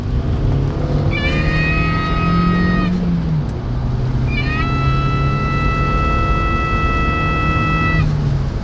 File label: Scream